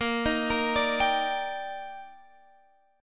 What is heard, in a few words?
D sharp Major 7th